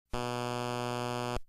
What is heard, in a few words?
low frequency periodic noise